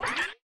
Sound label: Drill Prepare Attack; unsighted; enemies